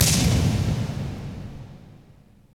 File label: SFX FIREW; AMG - Now CD-ROM (Roland); Megabass 1